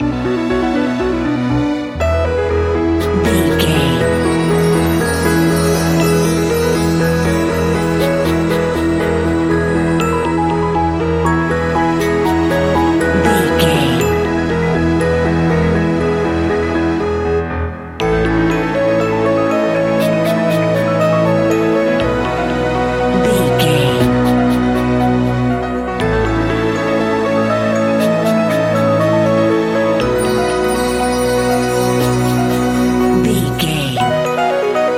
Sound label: magical video game level